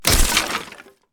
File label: wood impact; Angry Zombies